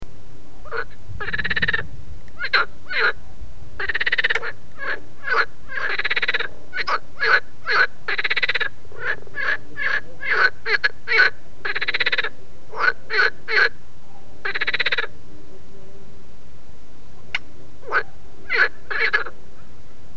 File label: Pelophylax ridibundus; BIOAC AMPH